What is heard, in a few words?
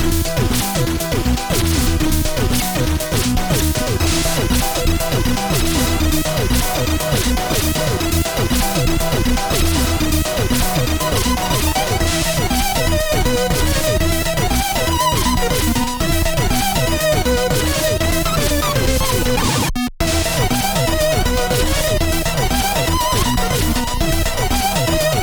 music forest boss loop; Skellboy